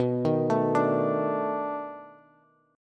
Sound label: B 7th Sharp 9th B down Bass; arpeggiated